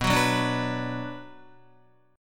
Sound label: B Minor, Guitar; Bm strummed; 6,9,6,7,6,7; JGuitar